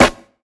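sharp acoustic snare sound a key, tuned to the A note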